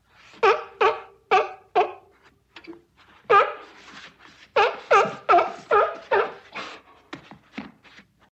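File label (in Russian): звук тюленя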